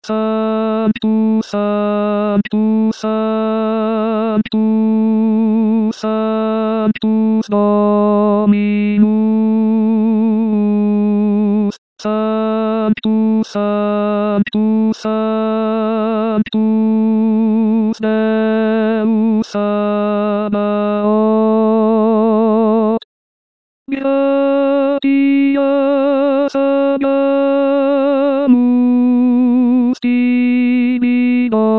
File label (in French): sanctus tenor